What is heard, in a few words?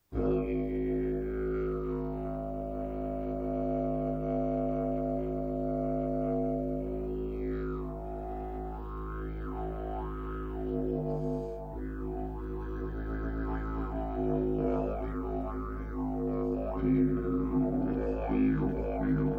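pvc G Sharp, didge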